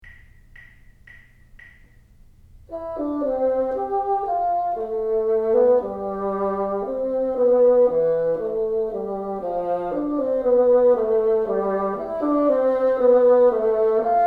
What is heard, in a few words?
teacher, bassoon